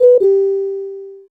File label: Unused Jingle; Controller Test Cartridge